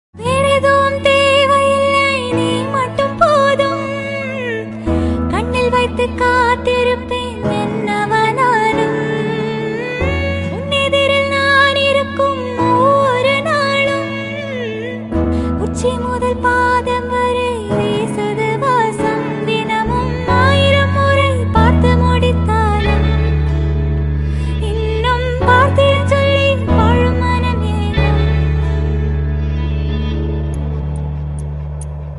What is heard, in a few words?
communist tamil song